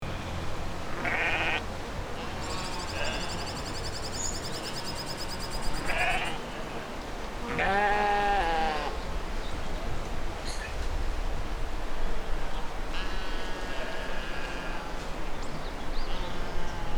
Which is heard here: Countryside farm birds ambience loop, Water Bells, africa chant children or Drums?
Countryside farm birds ambience loop